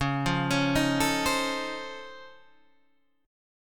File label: C sharp Augmented Major 9th down Guitar Half Step Down, chord; C#+M9